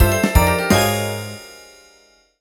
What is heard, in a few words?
JGL BATTLE ROULETTE; Girls RPG Cinderellife; 3DS